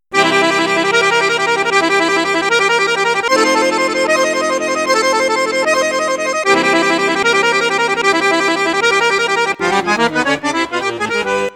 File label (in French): registre basson flute juste flute haute piccolo, accordeon; cavagnolo professionnel 12